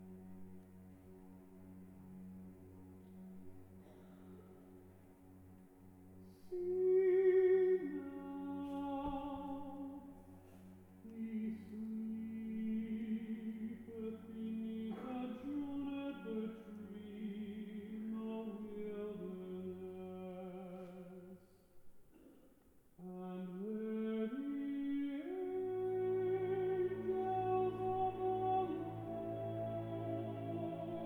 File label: tenor